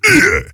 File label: pain; Deadlift